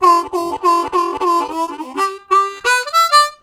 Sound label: Fast Up, Harmonica; Zero G - Harmonica